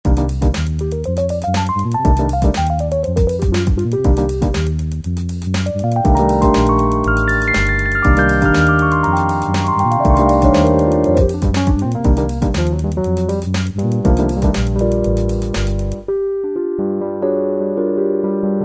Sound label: jam EP, E-mu PK-6